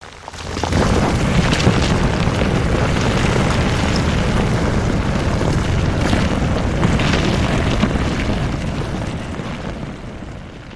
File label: cliff failure